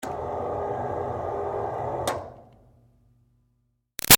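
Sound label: Projector Screen close up Up Medium; Offices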